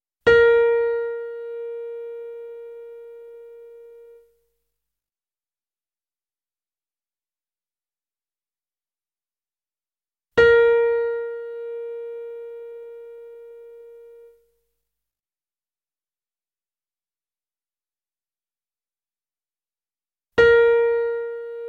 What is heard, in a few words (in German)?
Stimmtoene Bb1